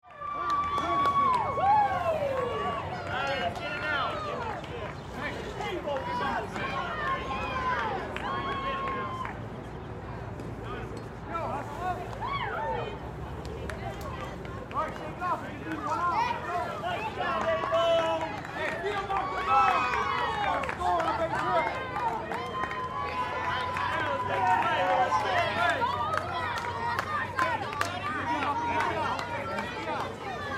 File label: Baseball Game, Sports, Little, League, Crowd, Cheer, Ambience; Blast Wave